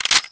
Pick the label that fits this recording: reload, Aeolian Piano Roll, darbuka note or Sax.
reload